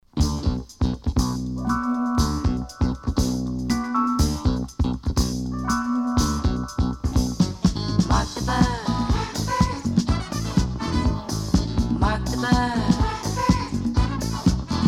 Vocal

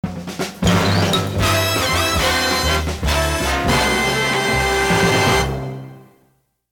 Fast Hard Driving; Pams

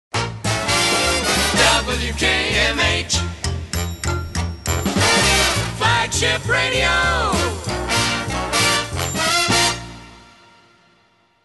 Radio Jingles; WKMH

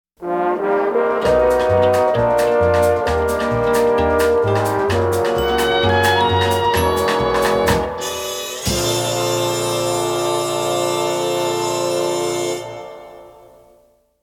Tango Swing; Pams

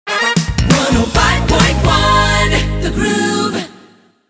Theme JINGLE; WGRV FM The Groove

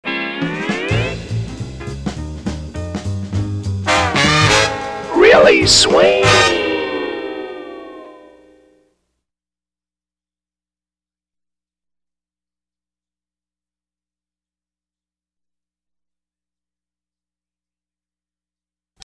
Really Swings; Pams

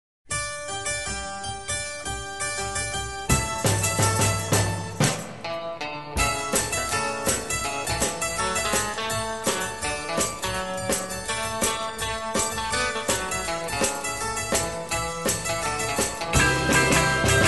Bed Of Music; Pams

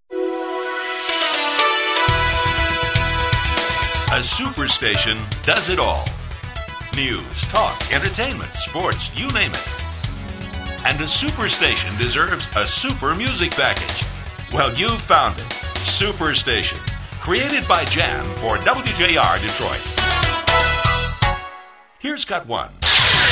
JINGLE; WJR-AM; Superstation WJR 760 Detroit

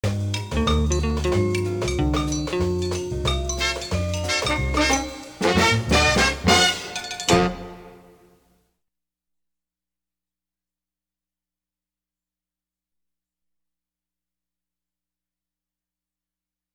Swing And Tango Together; Pams

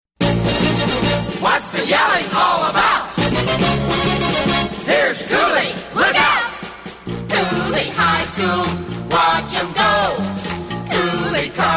W X Y Z Jingle; WXYZ-AM; Cooley High School

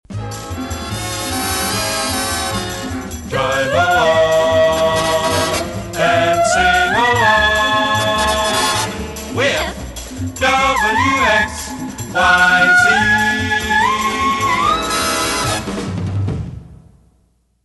Drive Along And Sing Along With W X Y Z

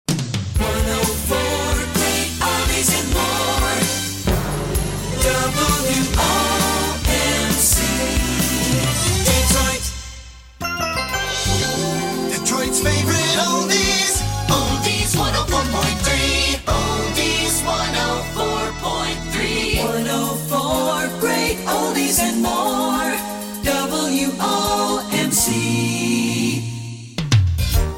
Jingles; WOMC-FM; 104.3

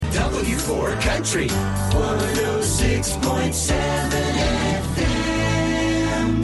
FM Country Early 90s Jingle; WWWW-FM; W4

